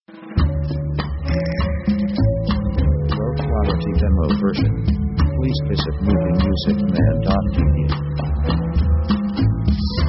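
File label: orchestra latin passion; Latin themes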